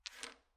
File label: domino